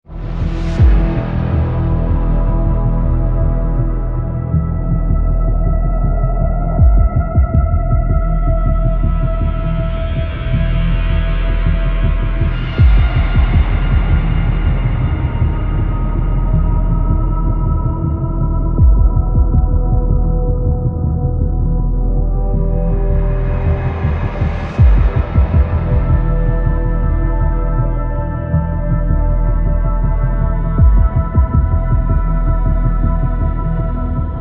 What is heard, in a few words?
Suspense; Boomopera